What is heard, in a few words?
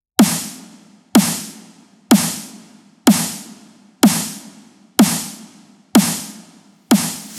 Bass House Kit, 125 bpm, Snare; EDM Drums; Drum Kits; Stems; Ghosthack; Ultimate EDM Drums; Bass House Kit 10